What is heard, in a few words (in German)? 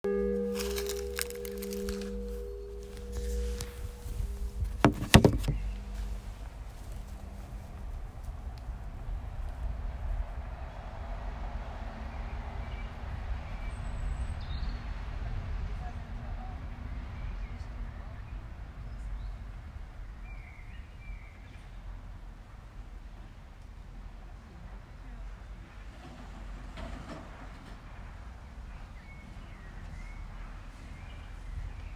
Glockenkonzert; Ostern 2020